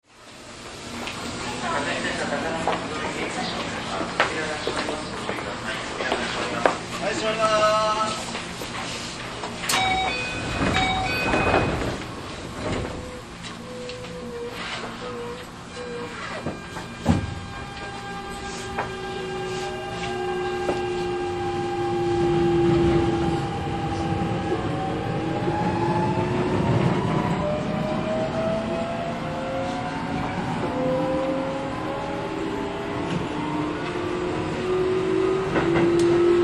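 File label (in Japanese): 8000系 明大前→下高井戸, 走行音; Keio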